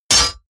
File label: sword hit metal